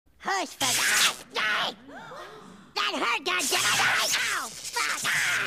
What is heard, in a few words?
horse